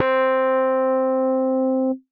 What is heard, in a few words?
Electric Tubes, Piano & Keys; Ableton; Factory Packs; Chop and Swing